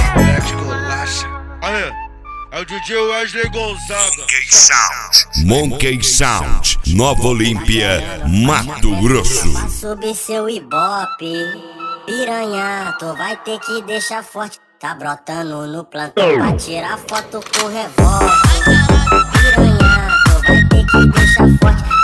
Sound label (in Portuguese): esp balada